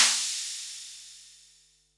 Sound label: HH, Pearl SC-40 Hihats and cymbals